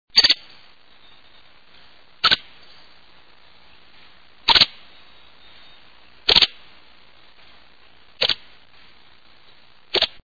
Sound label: White headed Woodpecker